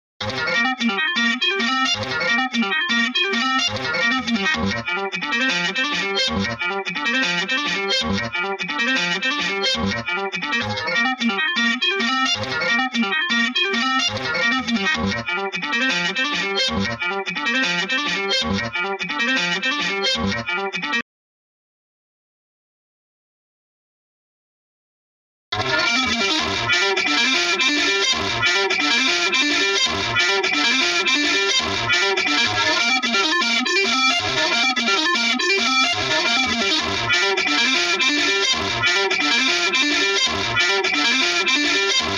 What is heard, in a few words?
lead